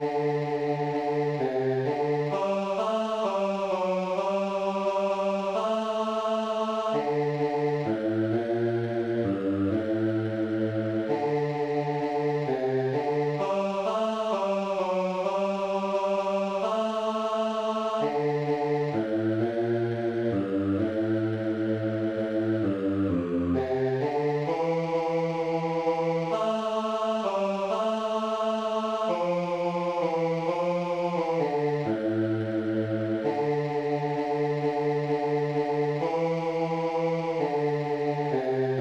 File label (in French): la nuit est deja la basse; veillez et priez